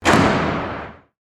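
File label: Slam Dunk; SFX; Slam Dumk 3; DailySounds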